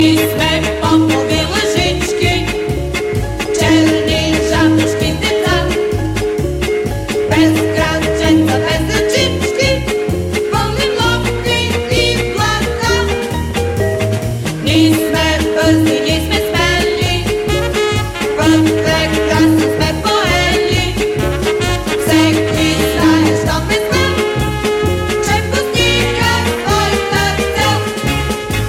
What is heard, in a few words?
rap baby, ringtones children